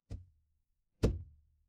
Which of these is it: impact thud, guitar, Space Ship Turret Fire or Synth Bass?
impact thud